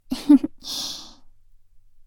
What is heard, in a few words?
laugh, ASMR; Garden; WithRin-Nazo01